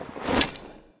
Inf Weapons Foley; Red Orchestra Ostfront 41-45

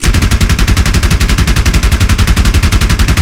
MG151 L; Weapons; DCS

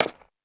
horse footstep concrete hard; Rust

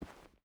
footstep rubber boots cloth walk; Rust

